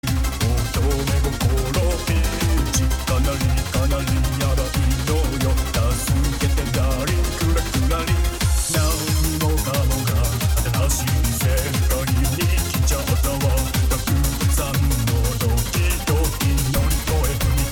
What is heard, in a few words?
Balalaika